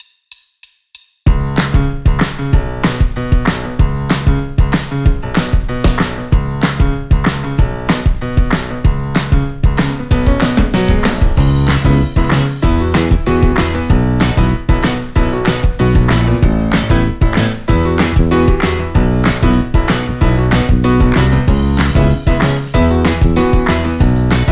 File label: Grand S Studio Pop, Piano; e-instruments; acoustic bundle